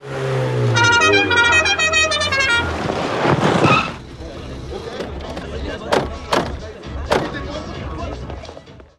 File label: klaxon